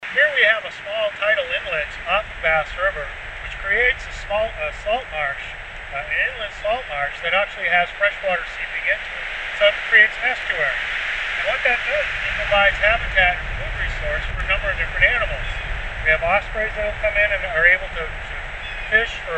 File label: Wind After